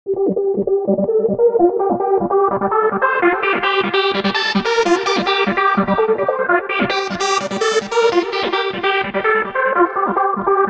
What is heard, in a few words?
Acid effect rack, goa trance bass; Ableton Live 12; instrument racks